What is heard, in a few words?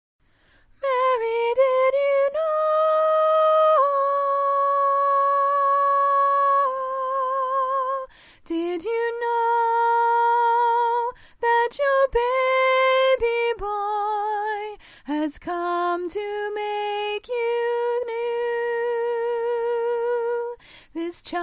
soprano, choir